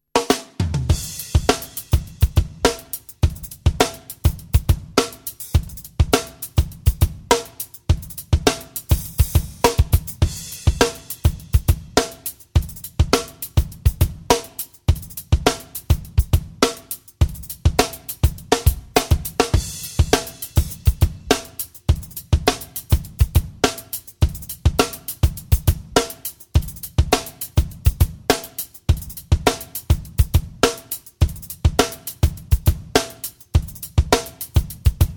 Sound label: Drums Aphex